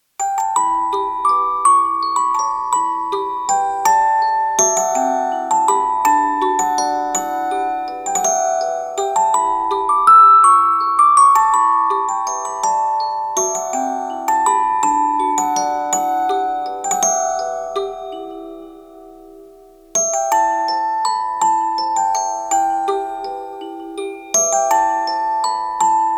Orgel; Tree of Savior

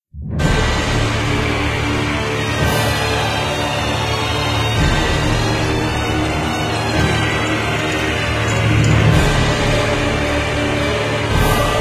Battle Sanctuary loop; Drago Noka; PS4

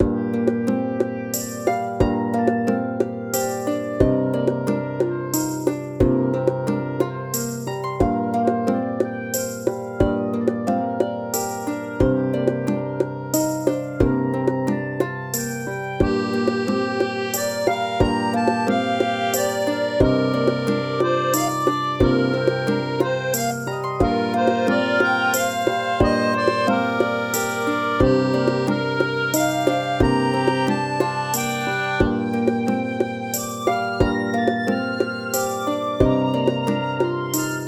Irish Pub; An Adventurer's Tale

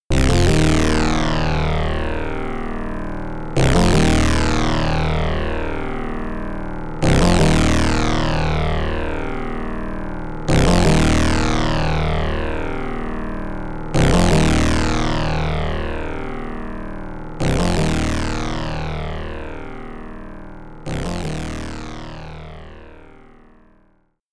SINE; Bacta; bacta 1 and 2 plus voice; pc 9821

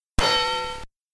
Bell Ding; Teleroboxer; Virtual Boy

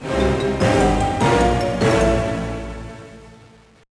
Failure; Over the Hedge: Hammy Goes Nuts; GBA

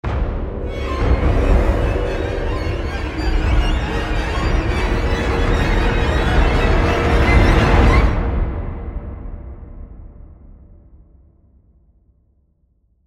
Log Break Music; bramble the mountain king; ps4 ps5 switch windows xbox one xbox series xs

